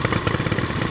Vehicle Quadbike Idle; Playable Tutorial Level; TR3

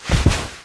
Macaque Roll; Playable Tutorial Level; TR3

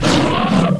Croc Death; Playable Tutorial Level; TR1

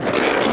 Skateboardkid Start; Playable Tutorial Level; TR1

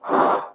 Scuba Diver Breath Land; Playable Tutorial Level; TR3

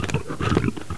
Bear Snarl; Playable Tutorial Level; TR1

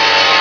Saw Revving; Playable Tutorial Level; TR2

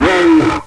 Giant Mutant Hit; Playable Tutorial Level; TR1

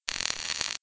Elec Short; Playable Tutorial Level

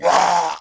Blowpipe Native Death; Playable Tutorial Level; TR3

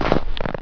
Henchman Feet Snow; Playable Tutorial Level; TR2

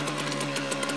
Vehicle Rubberboat Idle; Playable Tutorial Level; TR3

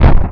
Yeti Feet; Playable Tutorial Level; TR2

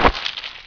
Spider Explode; Playable Tutorial Level; TR2

